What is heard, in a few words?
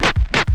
SCRAT, VNL FX; E-Lab; X Static Goldmine 2; akai